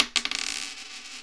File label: coin drop